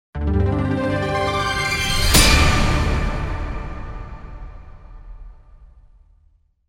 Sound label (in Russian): perehod k sledujushhemu jetapu